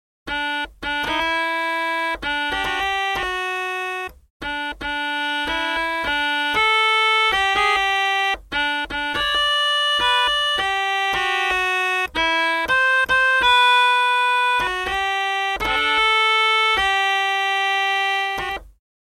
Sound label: melodica